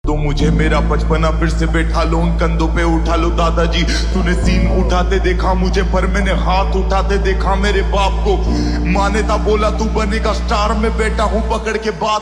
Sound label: Ringtone Slowed Reverb; Hustle 4